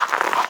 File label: snow wading; Scrat